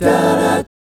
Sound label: GUY CHORDS, Vocal Planet Jazz; ILIO; Vocal Planet VOL-3; Jazz & FX